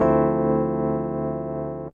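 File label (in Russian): завершающий аккорд сыгранный на музыкальной на клавиатуре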